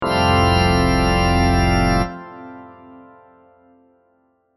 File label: Grand Fugue Stereo